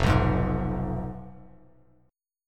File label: F Diminished F down Bass Standard; Fdim strummed; JGuitar